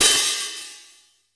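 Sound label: XE8 hi-hat; Crypton - DrumBase5000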